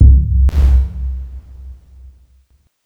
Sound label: bassdrum